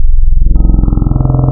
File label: Classic Synths wavetables